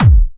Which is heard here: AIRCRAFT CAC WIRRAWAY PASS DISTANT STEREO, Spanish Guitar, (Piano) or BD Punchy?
BD Punchy